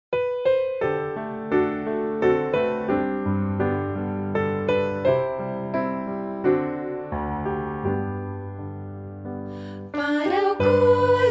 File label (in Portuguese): voz piano